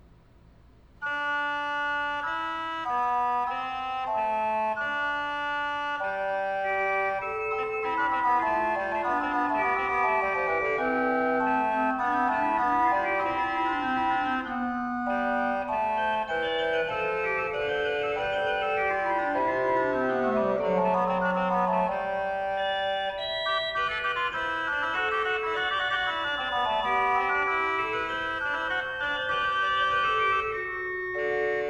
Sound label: Fugue, Organ; Magnificat tertii toni; Johann Pachelbel; Complete Organ Works